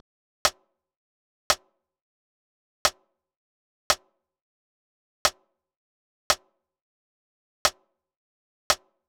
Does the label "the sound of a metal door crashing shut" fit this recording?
No